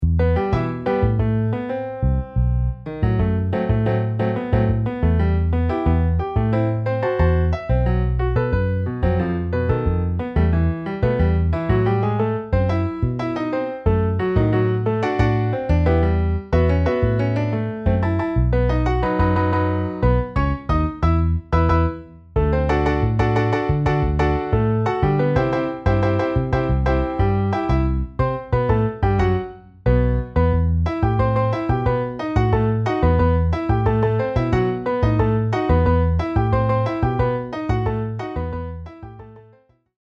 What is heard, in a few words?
Piano Bass